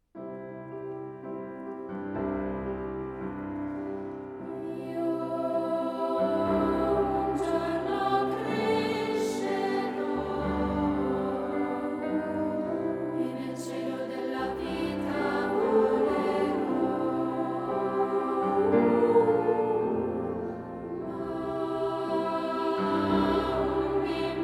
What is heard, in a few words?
childrens Choir